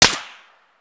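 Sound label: supersonic snap, bullet snap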